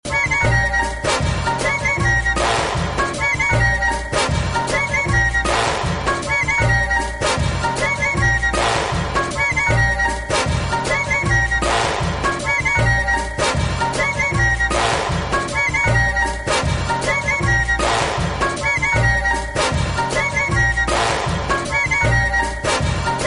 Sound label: Bossa Nova; Austin Powers